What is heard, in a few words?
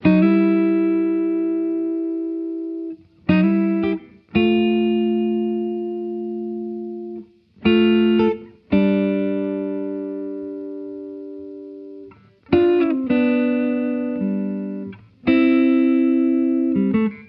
solo